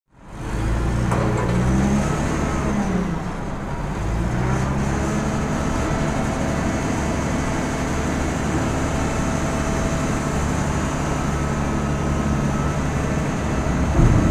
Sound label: trash truck